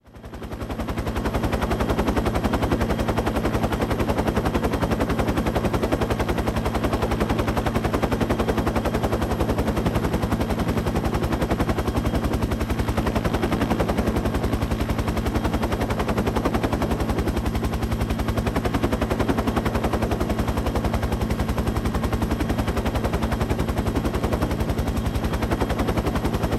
UH-1 Huey: In Flight. Good Prop Definition. Interior / Exterior Use; Mono